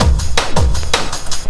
Drum & Bass, 160 BPM

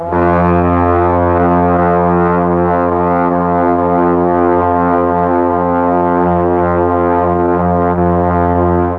F2 BRASS, Mellotron; Mike Pinder - Mellotron Archive CD

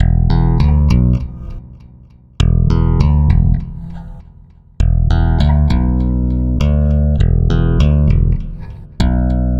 Stick Loop, Stick Bass

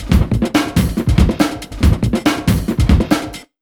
RUN 140, Big Beat Drumloops; AKAI

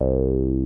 TB303; Zero G; Man Machine

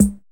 DRM OLD, dance synth

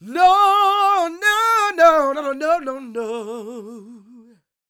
SOUL, Vocal Planet, Blues & Soul; CW SOUL

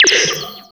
SYN FX; X-Static Goldmine 1

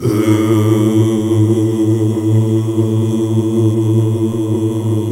Gosp. Oooh

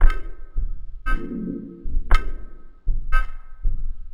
SECT FX, Industrial Loops

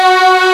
STR MID, FAIRLIGHT CMI IIX, STRINGS

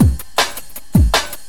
flow, breaks

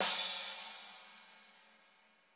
CYM; Bob Clearmountain Drums I

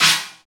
China Cymbal Stopped; Roland BK-5

